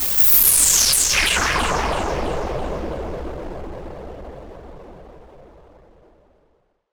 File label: Swept Noise